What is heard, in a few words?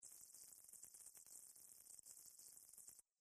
computer calculate